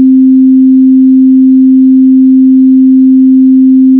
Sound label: tone sin